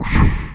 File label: APPEAR; DarkOmen